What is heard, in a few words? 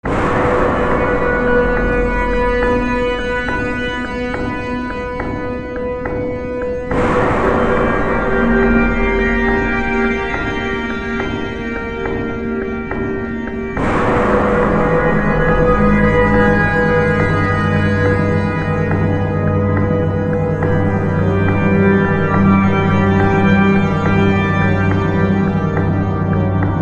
subway music; cry of fear